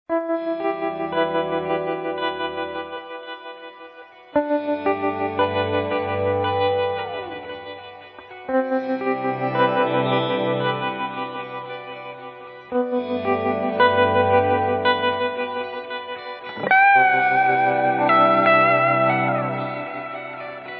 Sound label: android romantic, ringtones